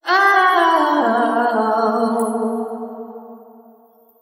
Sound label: voice ahohohohohhhh, Loops, Sad